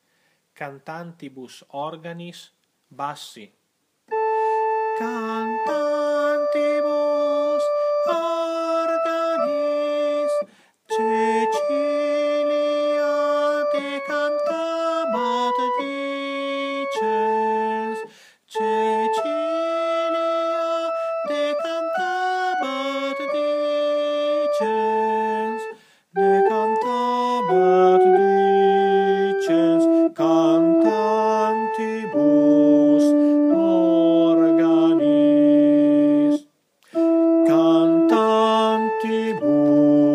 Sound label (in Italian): BASSI